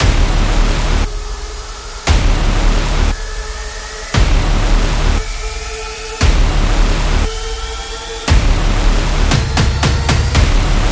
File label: Epic Intro Trailer; monster attack